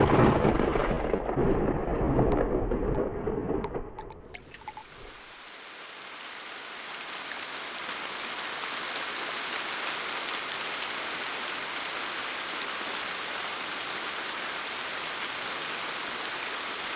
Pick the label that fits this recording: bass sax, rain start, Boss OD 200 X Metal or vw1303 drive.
rain start